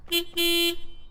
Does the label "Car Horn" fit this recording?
Yes